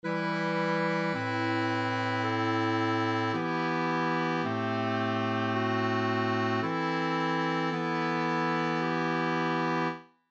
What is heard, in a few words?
suspensions